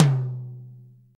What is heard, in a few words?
Big Room Tom Drum Sound B Key, Royality free tom drum single hit tuned to the B note